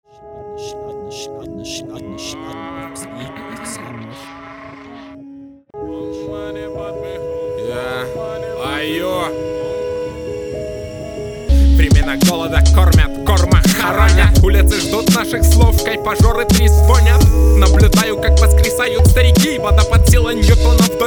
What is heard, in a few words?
rap